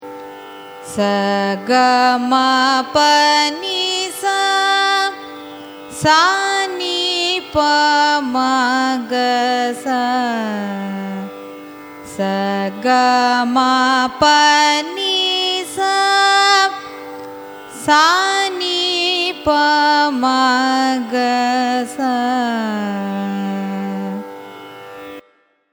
raga thilang arohanam avarohanam; carnatic music

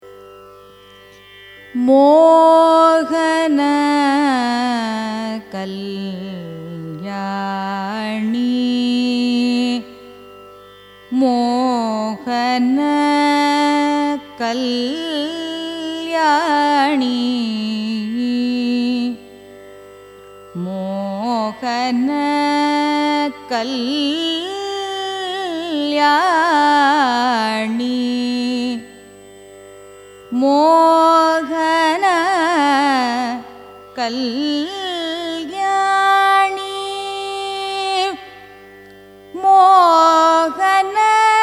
raga mohana kalyani signature; carnatic music

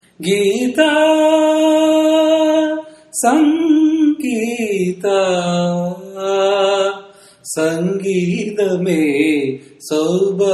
raga mohana kalyani cine; carnatic music

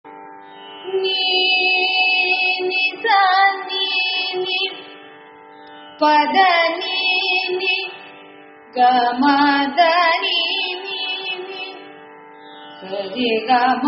kaarvai, carnatic music

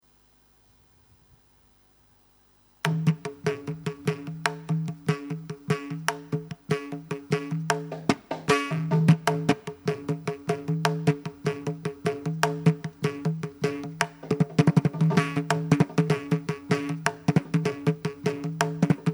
tarang mridangam; carnatic music; suri mridangam sample

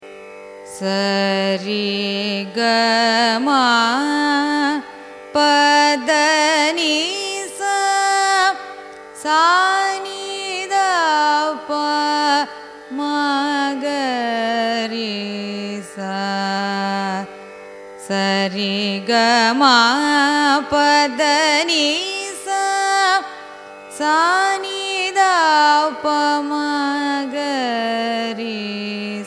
raga subha pantuvarali arohanam avarohanam; carnatic music

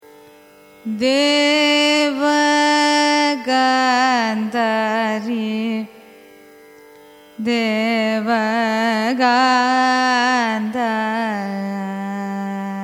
raga devagandhari, carnatic music

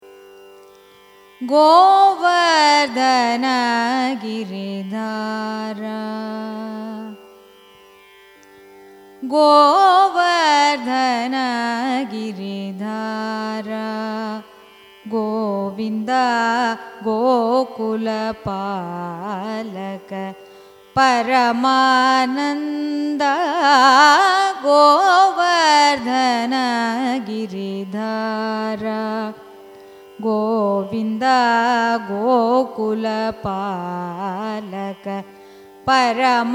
raga darbari kanada carnatic; govardhana giridhara

